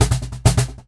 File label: Cajon Fill; musicradar